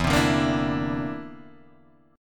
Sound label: D 7th Suspended 2nd Sharp 5th E